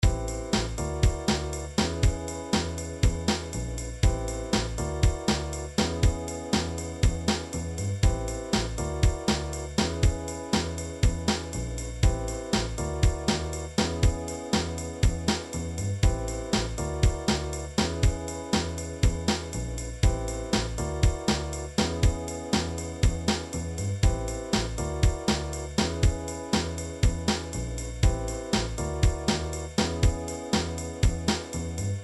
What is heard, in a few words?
jazzy